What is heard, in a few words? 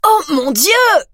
hurt